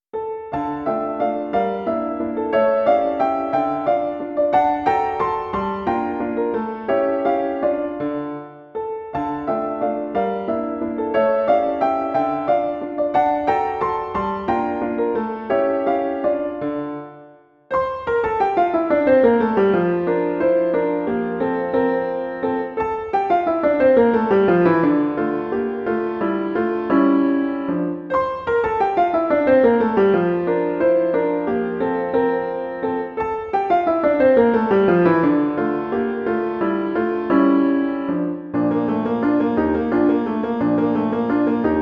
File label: waltzes for piano